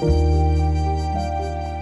Nice Chord; BB 2HTC Samples Vol 4; Pads

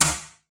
hit; breaking falling hit sfx

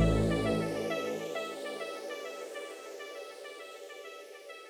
Fading Arp; Weird

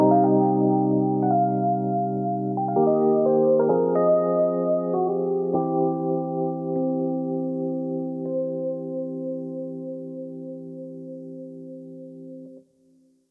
Rhodes Chords; Pads; BB 2HTC Samples Vol 4 Addendum

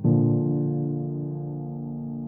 Cool Piano; Pads; BB 2HTC Samples Vol 4 Addendum

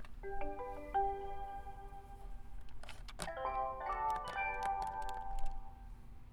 Fanfare; BB Retail Therapy Sample Pack

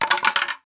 wood falling; wood metal SFX

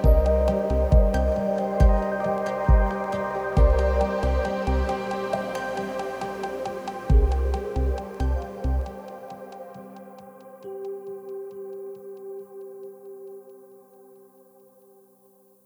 Blip Fadeout; BB 2HTC Samples Vol 4; Pads

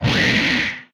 Explosion, retro arcade; MMRetroArcadeSoundsPack

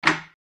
door open, wood metal; wood metal SFX

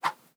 Classic Swish, organic wooshes; Micro Pack

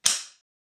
wood; RPG SFX

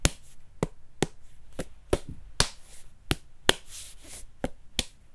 Rough Up the Melon; Micro Pack; CaptSubtle

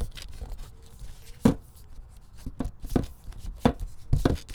Jostle Books; BB Retail Therapy Sample Pack

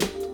Snare, Drums; BB 2HTC Samples Vol 4

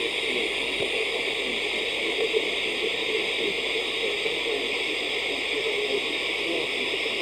noise; cc0 sfx loops

